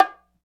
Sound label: New Hi Bongo, Roland BK-5; Percussion